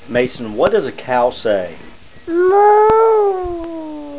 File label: moo